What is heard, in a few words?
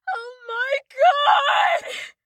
town human far; Blind Drive